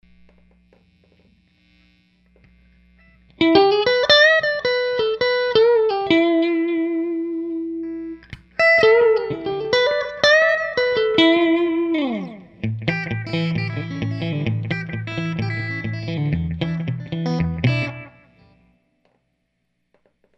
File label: pt80 slap